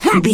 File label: voice male attack